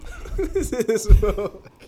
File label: laughter